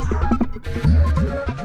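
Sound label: Music Dpad 145 Maxisphere; From Dust; PS3; Xbox 360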